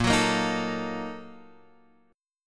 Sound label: A sharp Major 7th Suspended 4th Sharp 5th